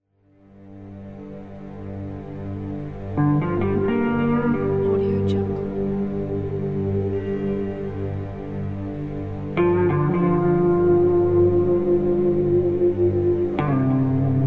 western ambient

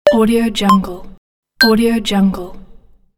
navigation buttons; invisible-aesthetic

